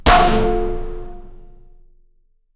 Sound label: very hard metal ragdoll; physical